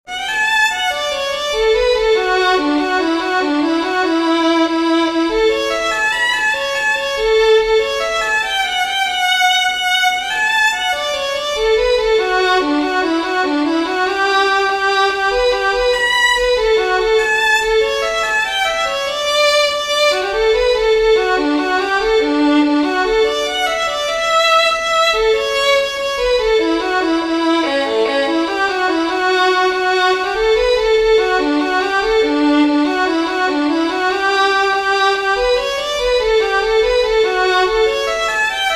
JIG, fiddlers; DAGENAIS SPECIAL

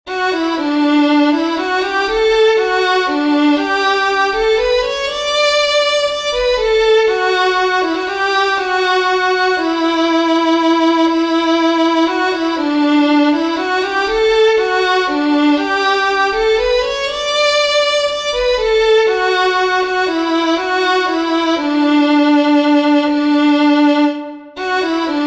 WALTZ, fiddlers; RIVER JOHN SUNSET; southern kings fiddlers

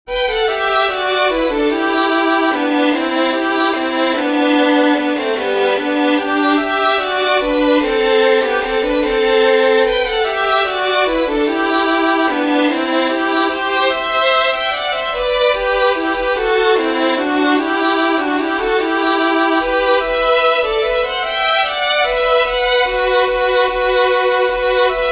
with Harmony, fiddlers; SEAMUS OBRIEN; southern kings fiddlers

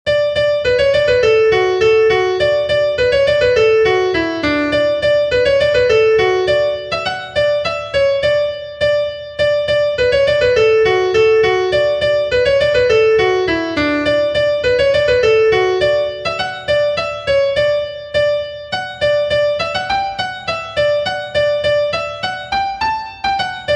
Polka Polka, fiddlers; southern kings fiddlers; John Ryans Polka Dennis Murphys Polka

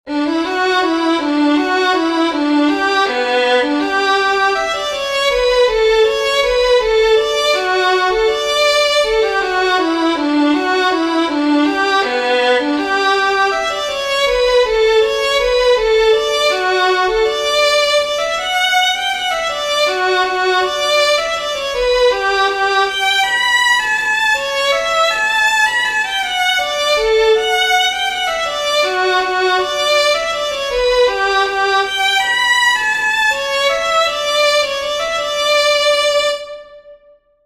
WALTZ, fiddlers; GOOD NEIGHBOUR

